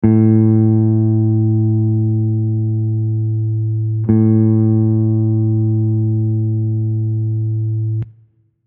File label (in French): corde